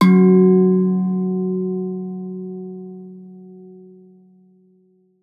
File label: vast note F